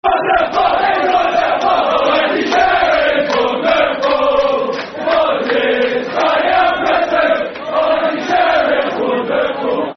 Lancashire Is Wonderful Football Chant (Soccer Songs); Preston North End Pne